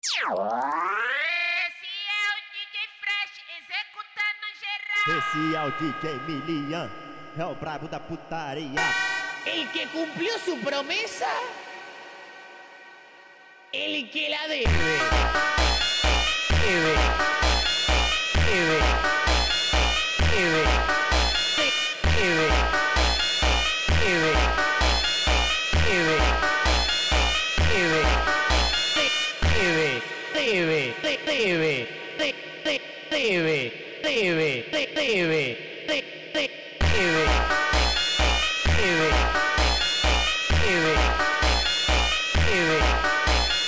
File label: Phonk